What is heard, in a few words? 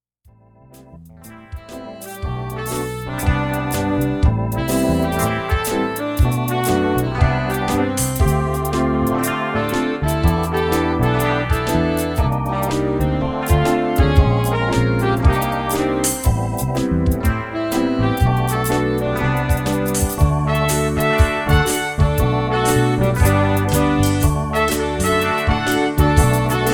Reggae excerpt